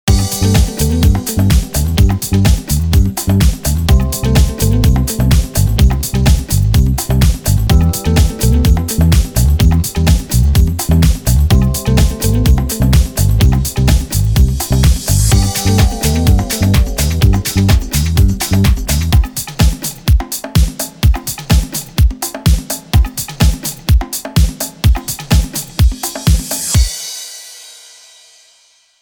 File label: BEATS